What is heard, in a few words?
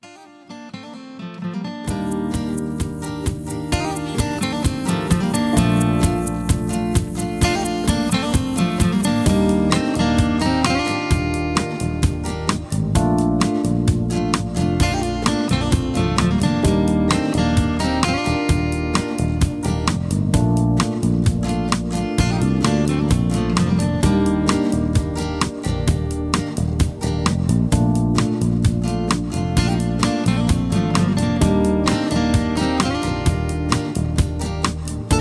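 No Leads No background vocals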